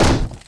Crane Hit

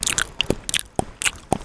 Use Meat

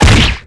Weapon Sword Hit

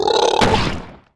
Monkey attack; Eft

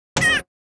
RUDOLPHPET HIT; Eft

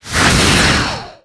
Magic Big Wind Fire

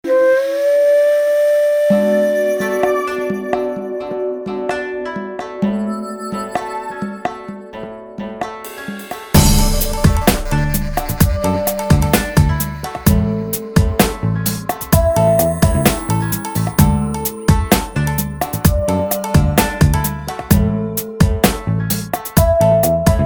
Music Odd Spring

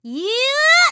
Christmas Melee Attack

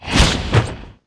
Pirate Master ATTACK; Eft

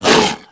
BLACKBEAR Hit

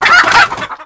Chicken Die, game; Eft

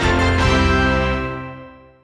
Weapon Skill Up

